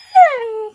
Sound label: whine, wolf; ayuncraft-1.5; mob